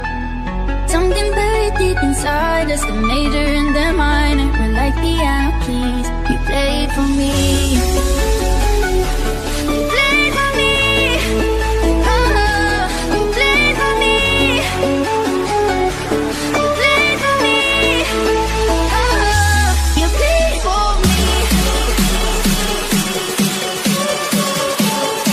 remix; english ringtones

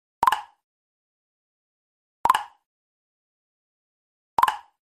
ringtone, nokia ringtones

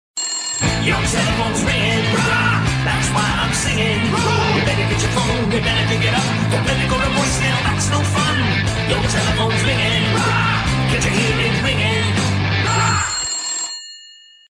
80s rock ringtone

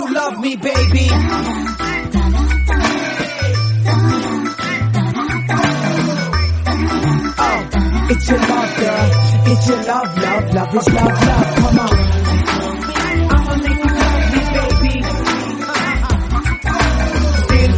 rocking song tone ringtone

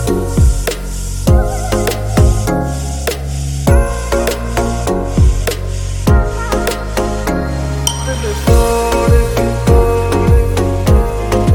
remix hindi ringtone

